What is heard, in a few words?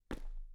Step on wood